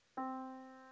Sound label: yamaha Disk